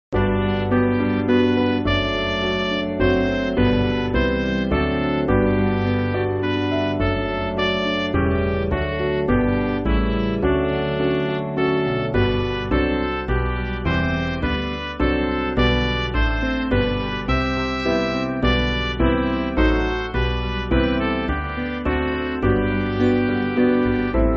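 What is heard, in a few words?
Midi, Music Backing Files for Churches; Fill Thou My Life; Richmond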